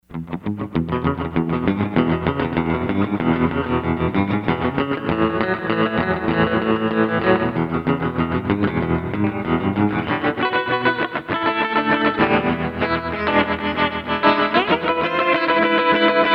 Rockabilly; Sony HR-GP5

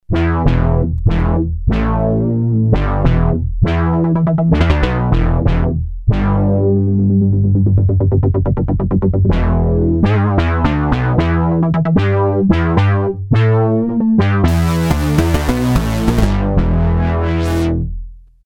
Old Funk Bass, Novation XioSynth; Novation XioSynth 25

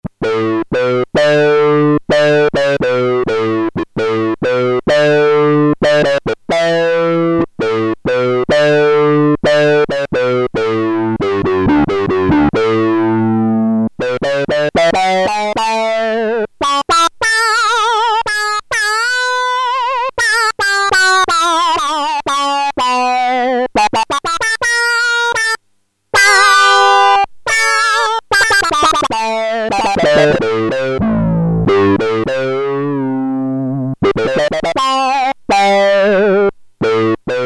Sync II, Prophet-5; Sequential Circuits Prophet-5 (Ver.3); Factory Patch

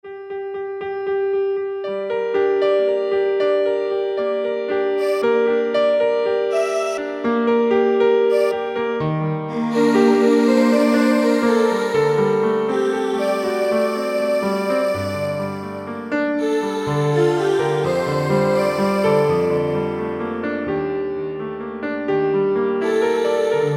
Velo Combi, Roland U-220; Factory Patches

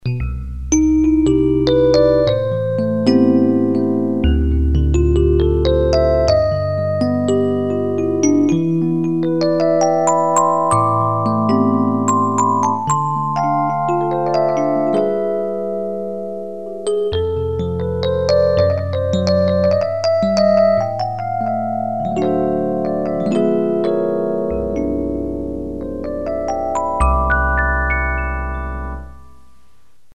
El. Piano; Generalmusic pRP8; Preset demos

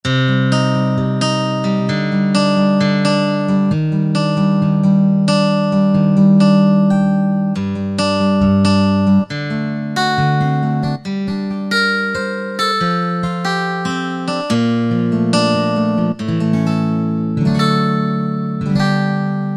Finger Pickin'; Korg TR-Rack

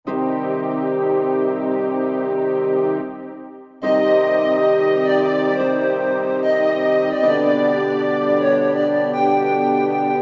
Orchestral Split

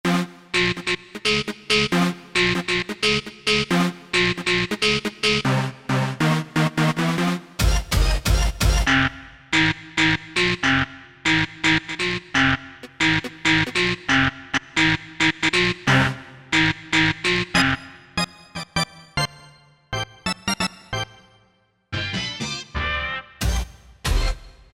Techno Hit; Roland SC-880; Factory Patches

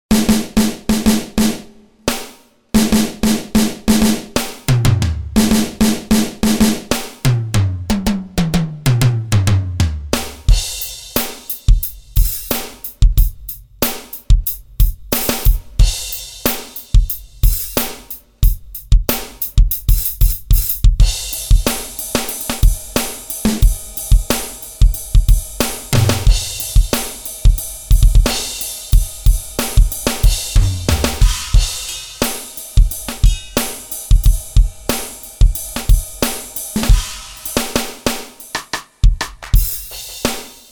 Standard Kit, Korg microX

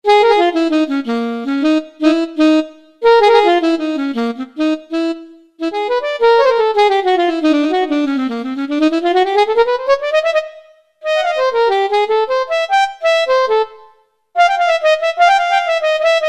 Bebop Alto Sax, Kurzweil K2000; Kurzweil K2000 Contemporary ROM

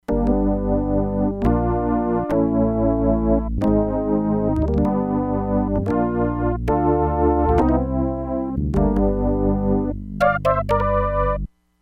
SYNTH ORGAN, Crumar Bit 99; Factory Programs

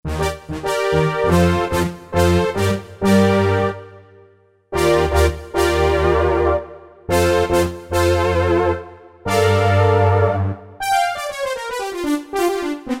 ANALOG BRS, Roland SC-880; Factory Patches

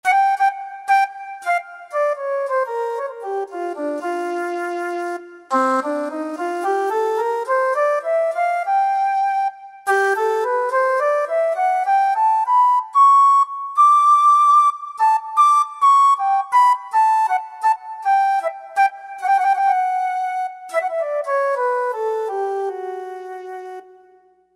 Sweet Silver Flute, Korg TR-Rack

